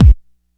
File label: solid kick sound c key, Royality free kick drum sound tuned to the C note. Loudest frequency: 161Hz